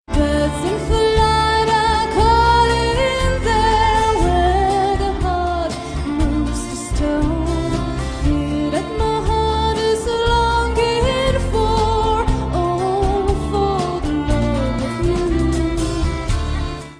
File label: Mystic call